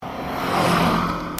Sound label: before 5k pass, cat-back; sti